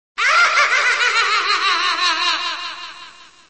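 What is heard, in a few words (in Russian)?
жуткий смех одиночный женский высокий мелодичный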